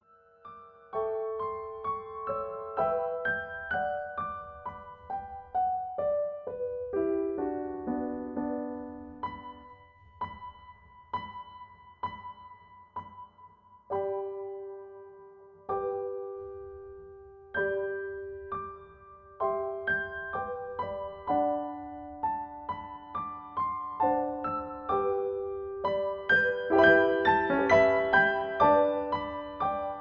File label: Accompaniment G